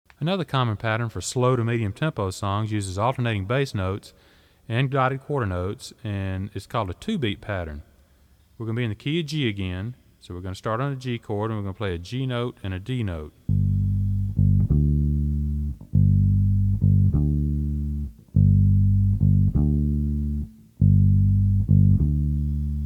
Two Beat Rhythm

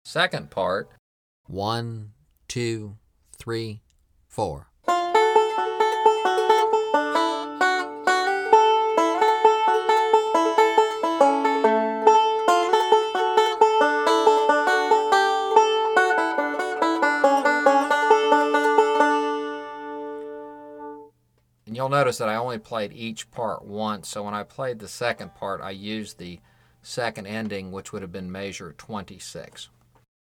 65 Bpm; Soldiers Joy Break 1

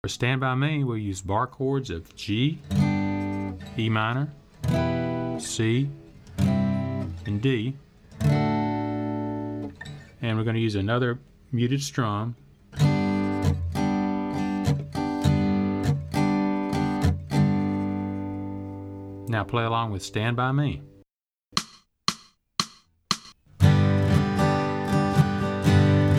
Barre Chords

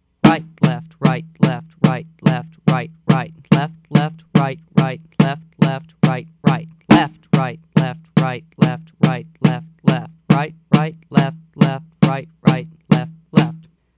Exercise Fast

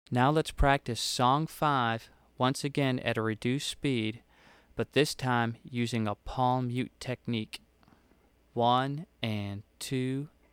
Song 5 Mute Slow

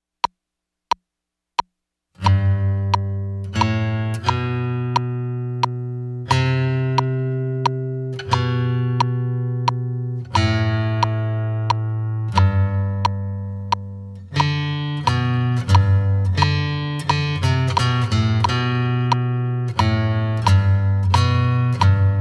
2nd Guitar Metronome; Minuet In G

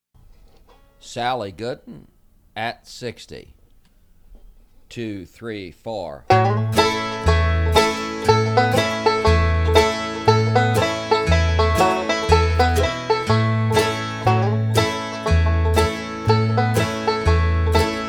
@ 60 bpm; Sally Gooden

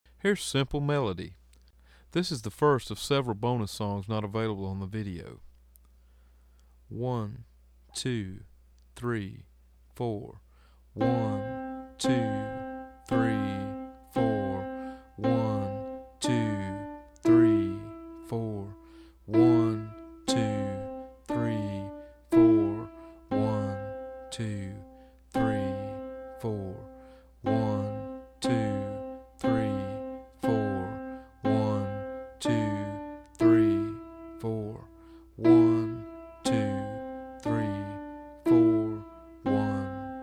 Simple Melody Slow

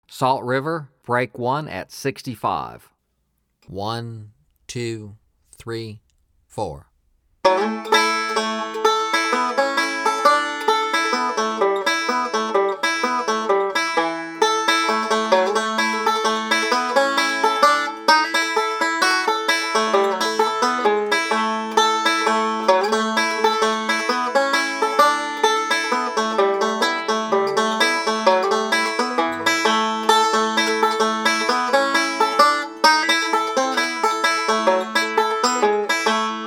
Break @ 65 Bpm

